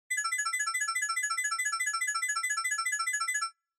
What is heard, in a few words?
Censor Bleep Synth, Long